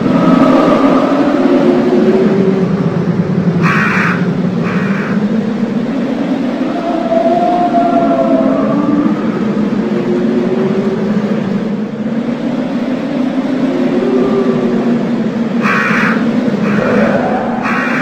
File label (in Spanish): ruidos raccoon; left4dead